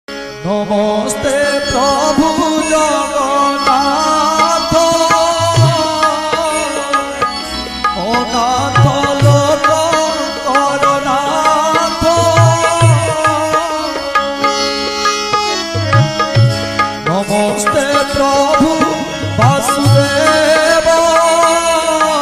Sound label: odia ringtone, bhajan ringtone; jagannath